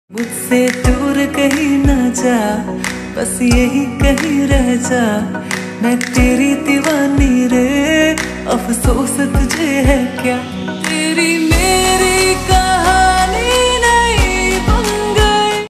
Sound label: slowed hindi ringtone